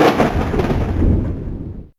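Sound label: Thunder; Yamaha DTXpress; Effect 1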